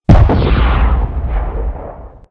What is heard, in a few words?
redeye weapon fire; Titanfall 2